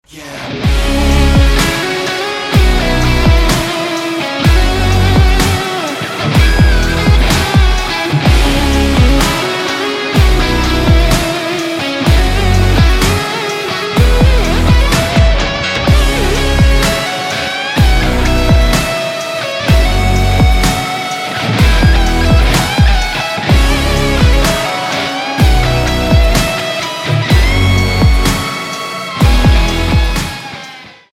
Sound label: Instrumental